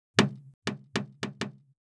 drop front, repeated impact small styrofoam compact sphere